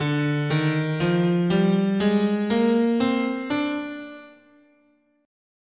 D Locrian, scale

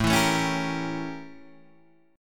A 7th Flat 9th Guitar Open D

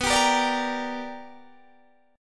B-Minor Major 7th Sharp 5th B down Guitar Open D

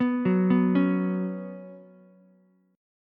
F sharp Suspended 4th, Ukulele; arpeggiated; F#sus4

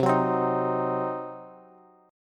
C Diminished C, Bass; strummed; JGuitar

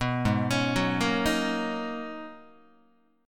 G sharp Minor 11th G sharp Guitar, chord; G#m11 arpeggiated; 2,4,4,4,2,2; JGuitar

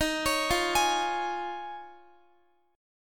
Eb 7th Suspended 2nd Suspended 4th Eb, Guitar; Eb7sus2sus4; arpeggiated

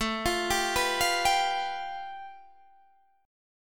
A 7th Suspended 2nd Sharp 5th, Guitar, Open D

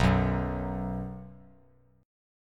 B 5th B, chord; strummed; 7,9; JGuitar

